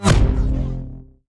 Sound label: proton fire; smite; zhongkui